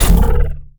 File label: atk laser saber